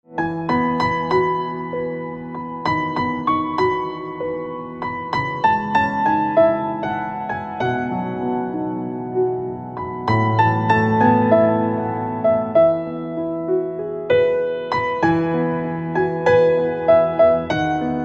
Silent Piano Instrumental Ringtone

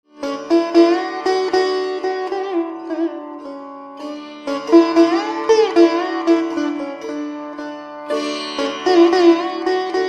Veena Instrumental Ringtone

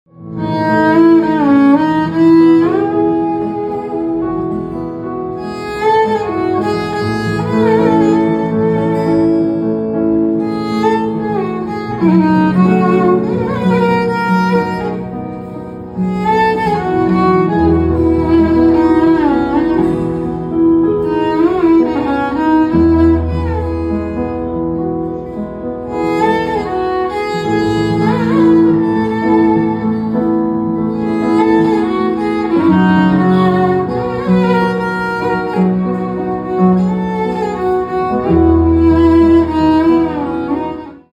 Violin Instrumental Ringtone

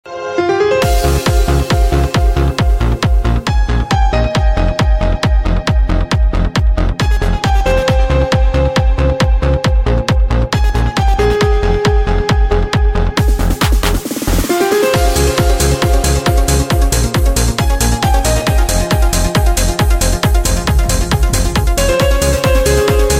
Technology Piano Ringtone

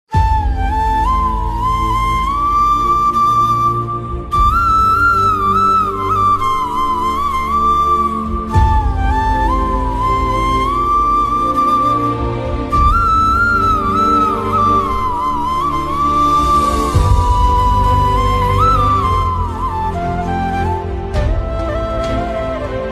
BGM Flute Instrumental Ringtone; Sita Ramam

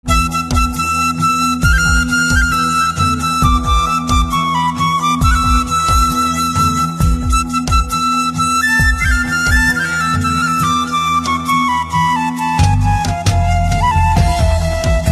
Flute Instrumental; Ringtone

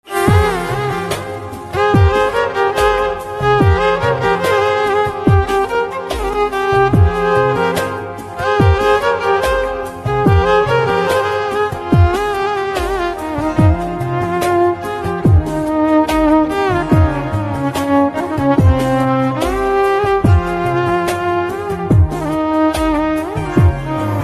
VIOLIN Ringtone

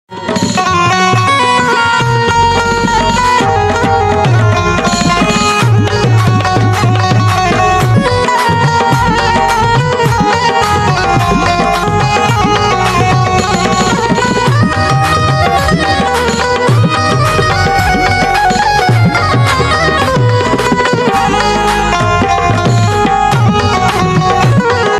Banjo Instrumental Ringtone

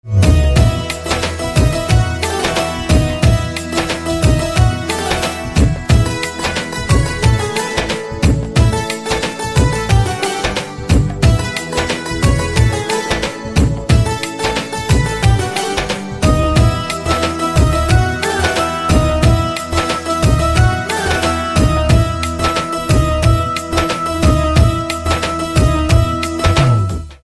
Santoor

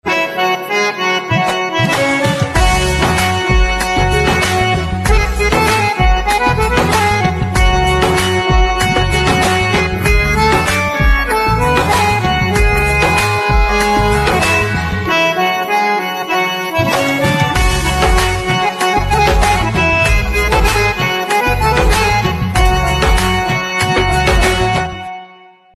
harmonium Instrumental Ringtone

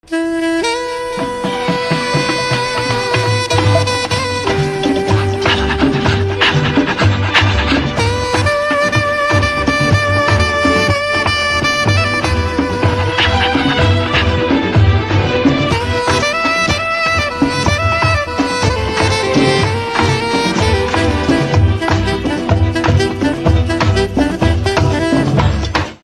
Saxophone Instrumental Ringtone

